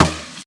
bow get; Fate; ITEMS

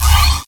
low mana; Fate The Traitor Soul

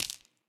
footsteps hive; Fate The Cursed King

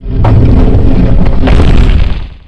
death, rock, monster; Fate

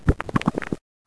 slime; Monster girl quest

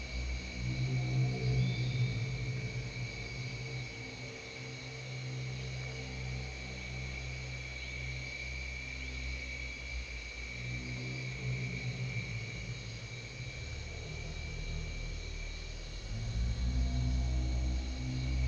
ambiance nest; Fate The Cursed King

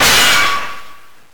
break; Monster girl quest Part1,2,3; Visual Novels

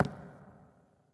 footsteps barrel; Fate The Cursed King

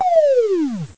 fall; Monster girl quest Part1,2,3; Visual Novels

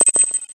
knife; Monster girl quest Part1,2,3; Visual Novels

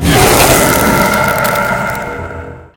breath ice, dragon; Fate The Cursed King; MONSTERS

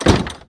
door close; Fate The Traitor Soul

